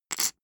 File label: poker chip stack small rattle short wobble